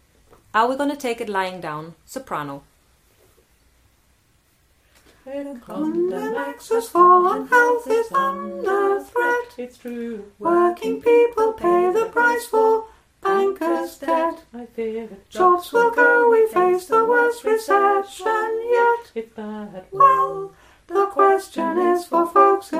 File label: Are We Gonna Take It Lying Down soprano